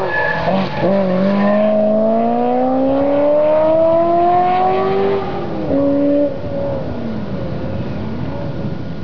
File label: ferrari